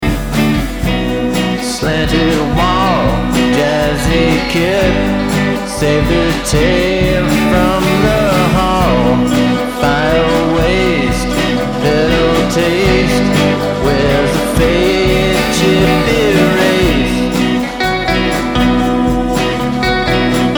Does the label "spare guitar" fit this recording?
Yes